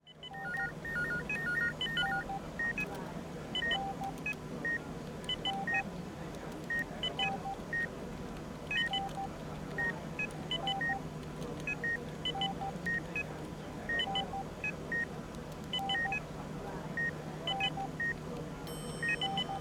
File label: Hospital Nurse Station; Medical; Blastwave